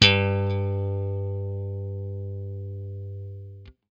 BLUE POP, Guitar & Bass; Quantum Leap; 90's Sample CDs

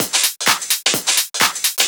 Discohouse Loop 128 BPM

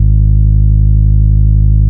G#1, Vintage Timetraveler; Sonic Reality; HAMDIRECT

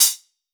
Crisp Angry A, Hats; Airwindows

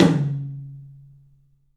TOM, 3D Percussives; E-MU Producer Series Vol. 5 – 3-D Audio Collection; PA Toms

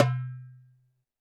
percussion, Roland TD-20

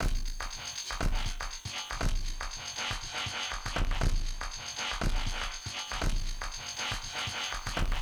Big Kick Beat, Electronic Beats; Sonic Foundry (Sony Creative Software); Myths of Technology

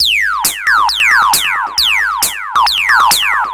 Lazer Face 135BPM, sci-fi Beats

